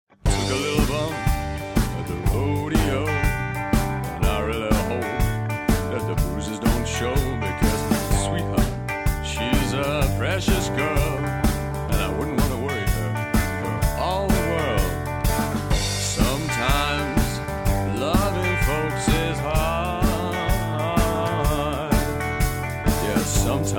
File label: Country Vs. Metal